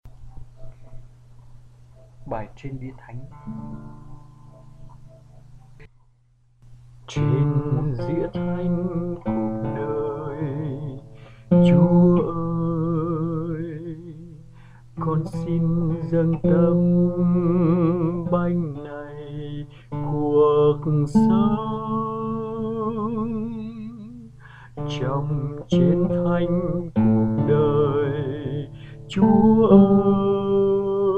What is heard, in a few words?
soprano